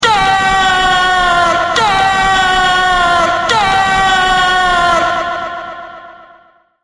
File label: goal goal goal